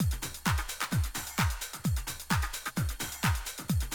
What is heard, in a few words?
130, Classic DrumLoops